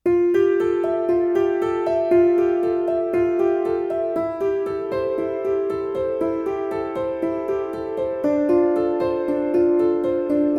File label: Gentle Rain (no cello)